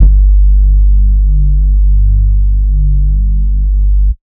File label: BASS 83 (G); neuro; Stanza